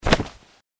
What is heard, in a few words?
feral ghoul swing; npc